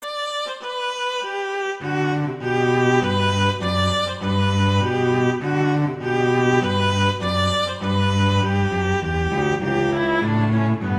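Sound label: Duet, horn; Mozart; Duets; Vla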